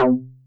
guitar pluck synth; yamaha reface cs

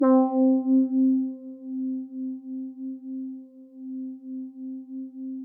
lead, yamaha reface cs

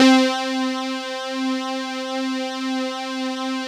pwm chorus, yamaha reface cs

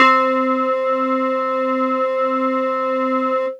fm bell, yamaha reface cs

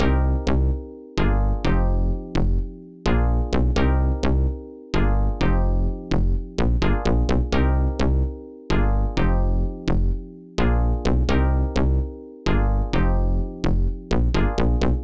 2op fm; opl; MPTMs; mods

